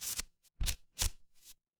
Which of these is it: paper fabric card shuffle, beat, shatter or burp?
paper fabric card shuffle